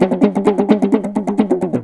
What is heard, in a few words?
PAPAN, Indian Traditions; USB Soundscan vol.45; AKAI